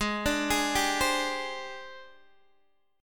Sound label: Ab Major 7th Flat 5th Ab, down, Guitar, DADGAD